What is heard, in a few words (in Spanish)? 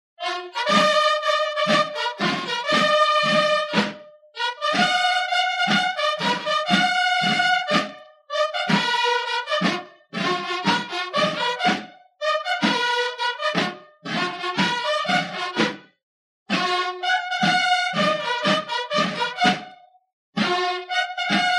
Toque Bandera, TOQUES MILITARES; MATERIAL CÍVICO